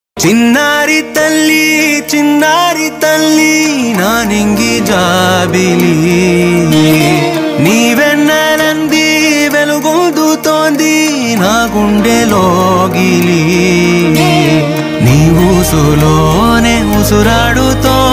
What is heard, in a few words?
song baby girl cute telugu